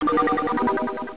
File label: Electric - Ring In